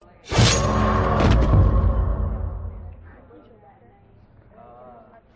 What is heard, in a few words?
game lose; gwent classic v4.0